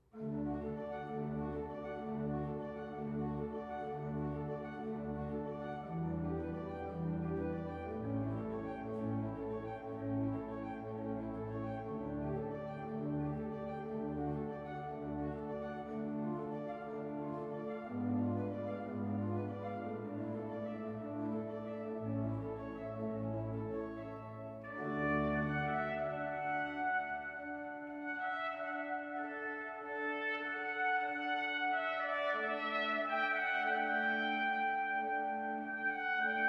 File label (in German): larghetto in d dur